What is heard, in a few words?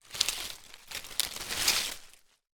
foley dried leaves twigs movements